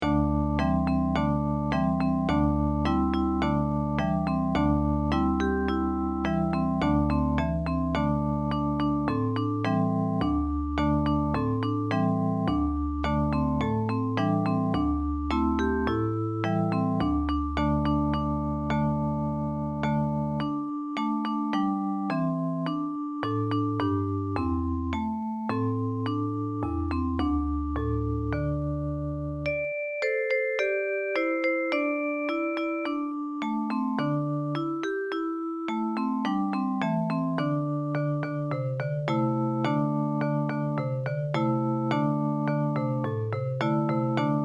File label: japanese folk song; nara no daibutsu san